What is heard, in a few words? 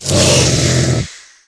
worm ouch, giant worm